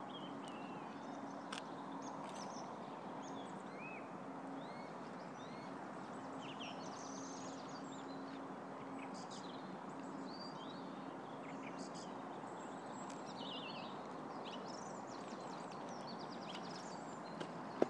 spring birdsong

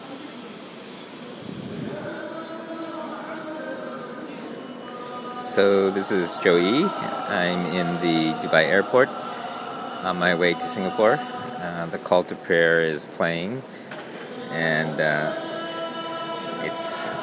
call to prayer in Dubai airport; dxb

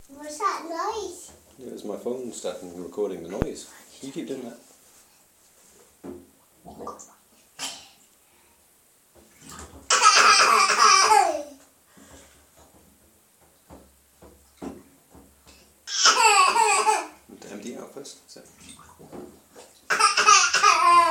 eheheheheeh

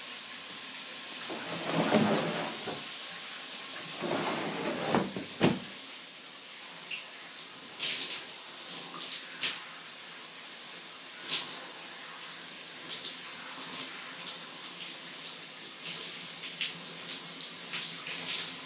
shower

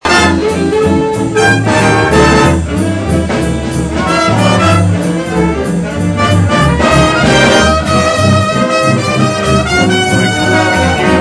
jazz

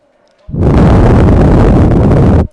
wind blowing

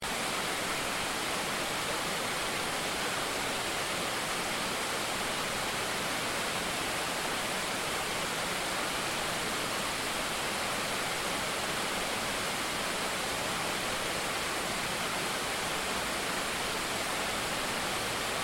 top weir by dam head bridge bretton park

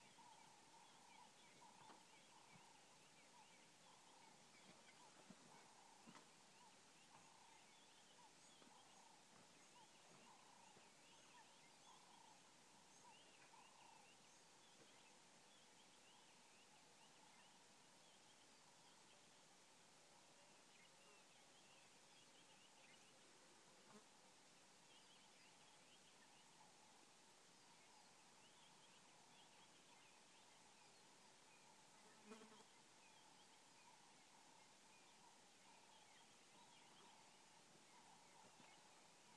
Birdlife Botswana third transect

